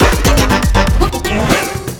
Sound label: failure; tf2ware ultimate; music game; switch moveit wario